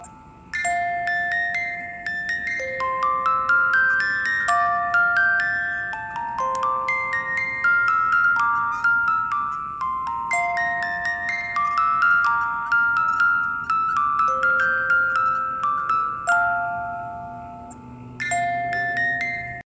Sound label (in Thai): กล่องดนตรีเพลง Canon in D; J.Pachelbel